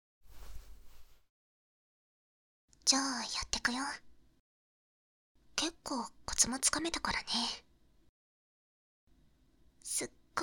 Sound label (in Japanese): 左耳かき開始; 耳かき・添い寝; あまあまお隣さんの幼馴染は癒し上手!?